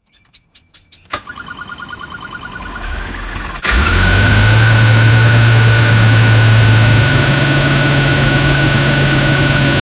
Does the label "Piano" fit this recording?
No